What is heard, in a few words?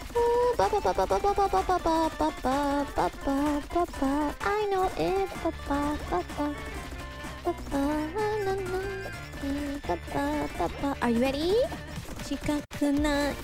humming; Suspect